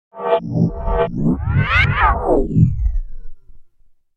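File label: sounds of endings outro, disappearance fading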